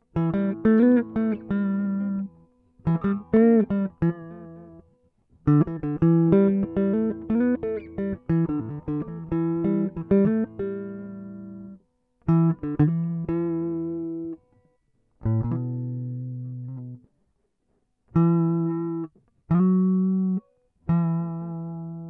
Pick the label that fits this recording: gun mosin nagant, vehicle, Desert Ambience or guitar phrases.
guitar phrases